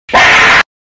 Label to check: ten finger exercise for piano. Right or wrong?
Wrong